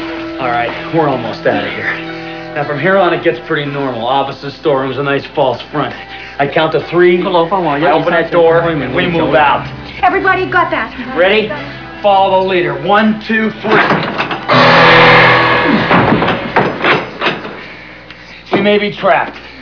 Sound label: windows exit